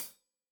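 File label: hat closed, hi-hat cymbals stereo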